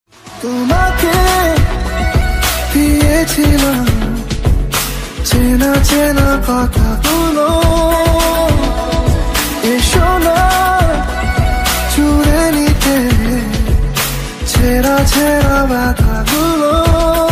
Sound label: Bangla Song Ringtone